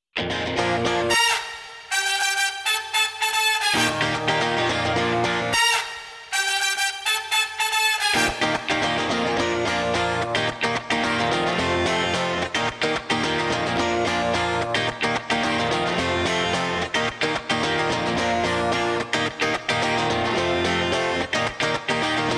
guitar

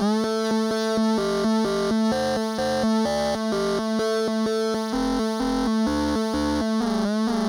synth riff

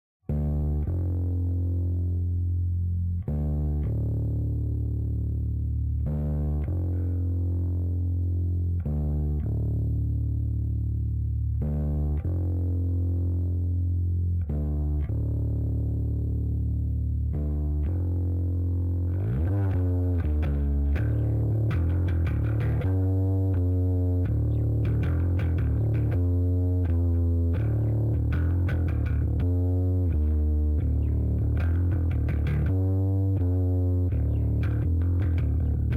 rhythm

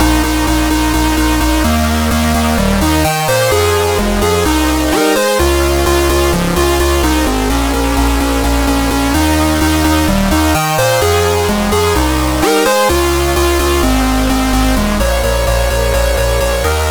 Bass + Synths (NO SIDECHAIN)

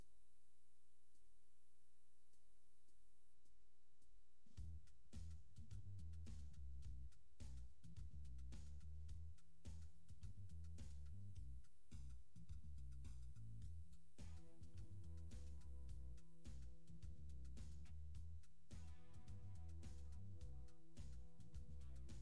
Keys

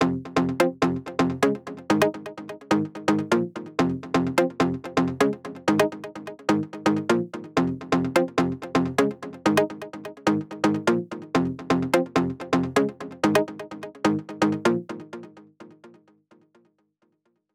House Chords

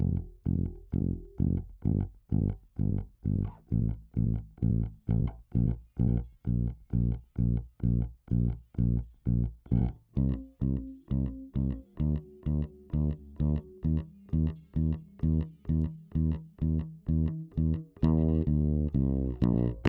Bass - Main Bass

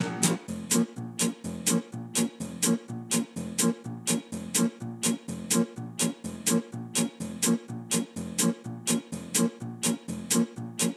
Jingle

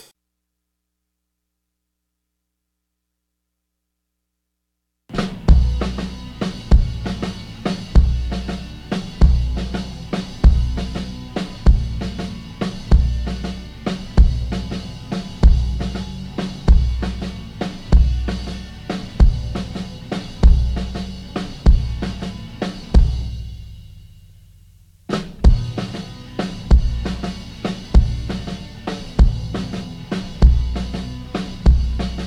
Kick

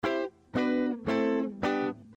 Guitar

